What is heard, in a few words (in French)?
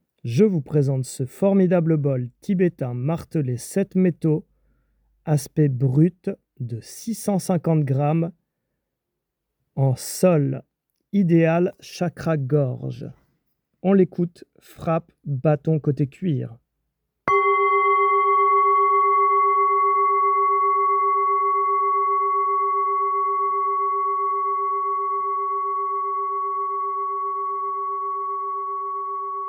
Bol Tibetain Martele Shinny Brut 7 Metaux Note Sol